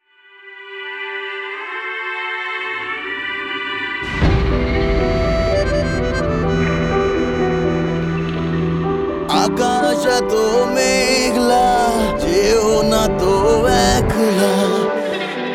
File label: Ringtone, ringtones; Symphony Roar V20